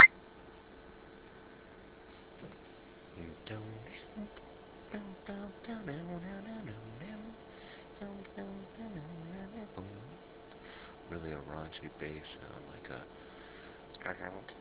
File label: Voice only funky bass